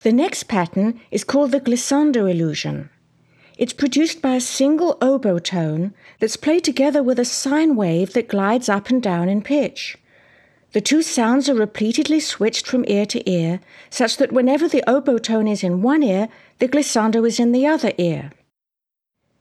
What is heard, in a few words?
Glissando Illusion; Deutsch; auditory demonstrations